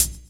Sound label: Hhat, Hihat; Equipped Music; Loungin House